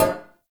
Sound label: Stone Cafe Table Impact; Ambience; Gameplay; Warframe; Nineteen Ninety Nine